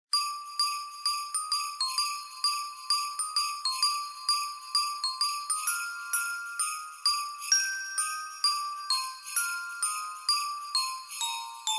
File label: christmas radio tune loop